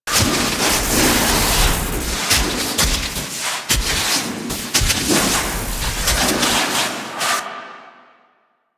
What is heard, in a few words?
Reactor Arc Loop; Warframe; Ambience; Corpus Gas City; Gameplay Remaster